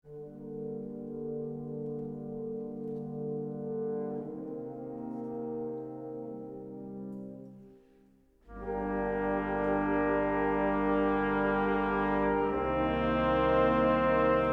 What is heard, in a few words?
Dark Foreboding Crescendo